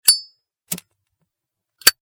household cigarette lighter metal fliptop; Cigarette Lighter Igniting ( Metal Flip Top Style ); Lighters

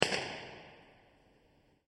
Huge Bright Firecracker Bang; Firework; Backgrounds; Crafted Signals

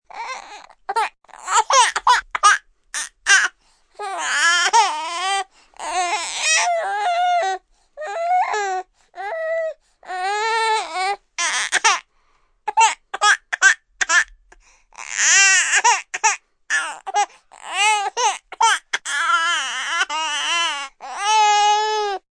Cry Baby Long

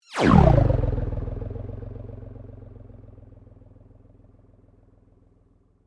Sweep Mechanical Medium

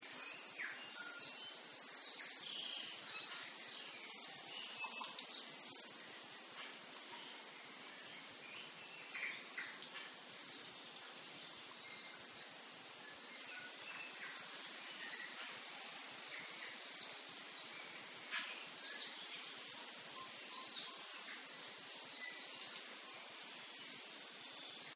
Rainforest; Nature Scenes

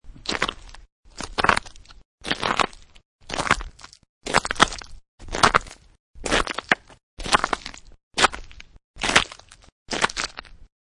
Snow And Ice Footsteps Ice Very Cracky; Ice (Sound Effects:Weather)

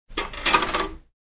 Put down ice scoop.; Drink Mixing; SFXsource

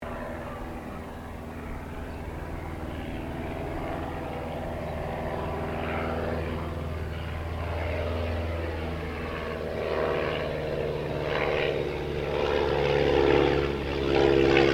AIRCRAFT RYAN STM-S2 PASS; 1939; Airplanes; Vehicles

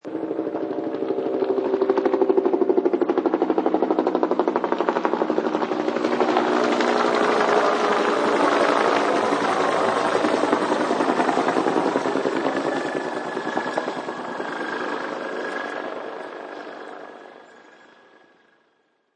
Helicopter; Helicopter Ringtone; Novelty Ringtone

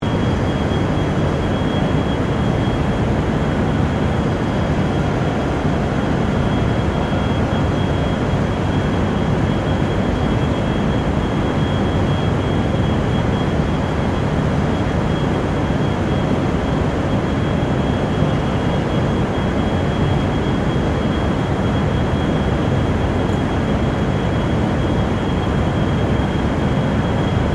Ambience Railway Station General; General Ambience at Southern Cross railway station; Train Station Sound Effects; Foundation1

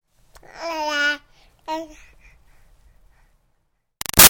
Baby 6 Month Old Talk Short Answer; Babies; Voice

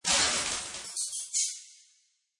Digital Hit Reverb, Scifi; Scifi Production Elements, Set 1; Digital Hit Reverb Slow; Lynne Music

